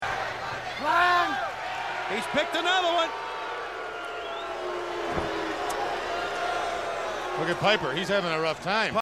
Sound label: boos; kickboxer